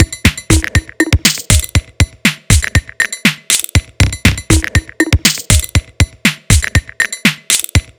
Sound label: Dialing Beat, Drum Loop; Sonic Foundry; The Electronic Music Manuscript Collection; Drum Loop Structures